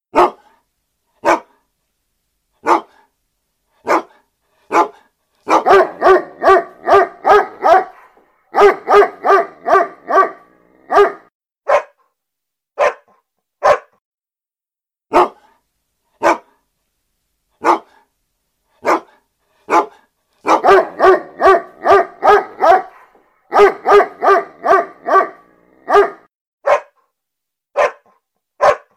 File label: animal sound